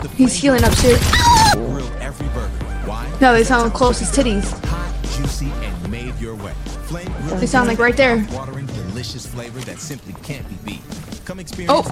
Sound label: riley ahaha